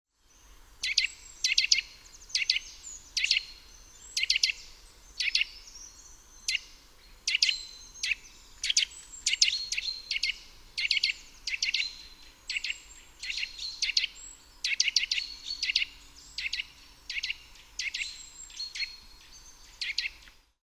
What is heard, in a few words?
Sitta europaea